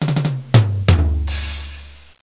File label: Drumrol1; Interactive Cosmos; Kosmos